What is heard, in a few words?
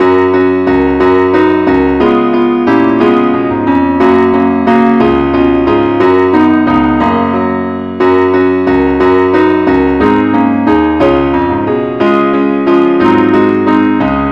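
keyboard only key F sharp; angelvoices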